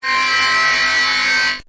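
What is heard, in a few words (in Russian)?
специализированный звук сигнализации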